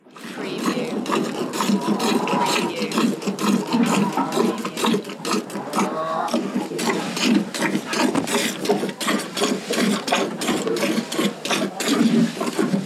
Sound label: Cow Milking